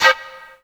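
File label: Yamaha TG100 Breath Noise C5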